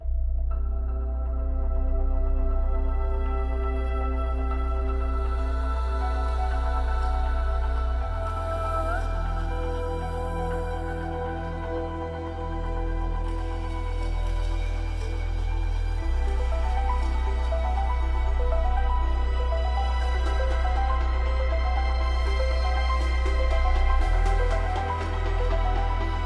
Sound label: Decisive Battle loop